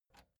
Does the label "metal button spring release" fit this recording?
Yes